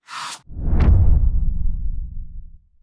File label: card checkout